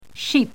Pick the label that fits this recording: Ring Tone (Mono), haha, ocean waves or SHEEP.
SHEEP